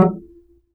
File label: D STRING UPRIGHT BASS, Upright Acoustic Bass